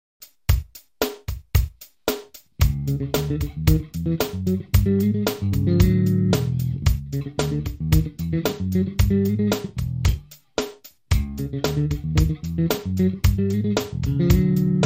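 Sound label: Thumbpickin bass octaves with E9 chord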